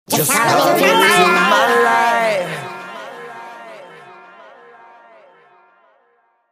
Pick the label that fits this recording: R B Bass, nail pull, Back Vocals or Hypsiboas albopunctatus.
Back Vocals